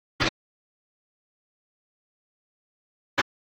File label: Train, Cartoon Crashy Train Stop; HB04 - Hanna Barbera